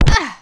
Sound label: jump